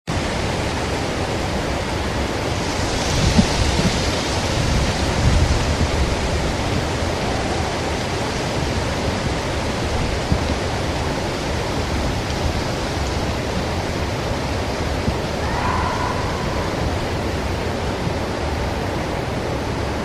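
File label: vocals, Possible Bigfoot vocalizations; Unknown Vocals; This was recorded in Eastern Oklahoma on January 23rd, 2010 at 01:28 hours.; public camp ground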